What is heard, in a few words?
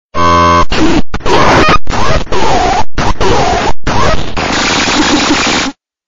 Glitch 3D